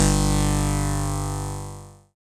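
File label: synthesizer; Best Service Gigapack 1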